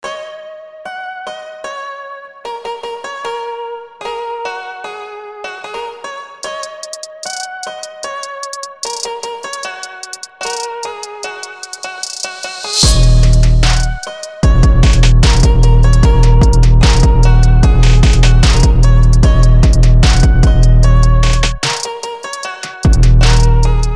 beat japan

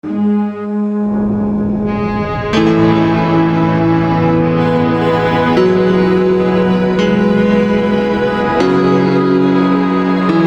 soundtrack

